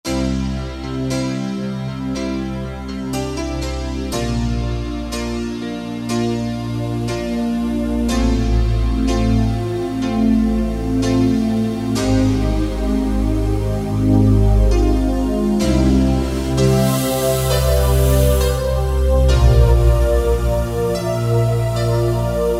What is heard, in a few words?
Piano & Voice, Roland JD-990; Factory Patches